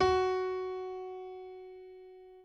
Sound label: piano chord